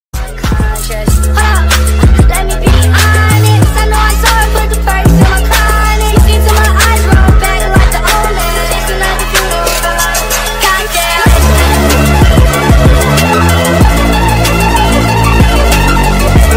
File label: remix ringtone; tiktok remix